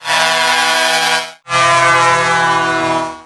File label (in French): trompe klaxon 120 decibels; Trompe Nedking 95CM chromée; 180 MM et puissance 120 décibels; Avertisseur sonore de 37,4 pouces; Nouveau son du klaxon Nedking avec le Iveco S-Way de Ludo (La Caussenarde) !; Nécessite une électrovalve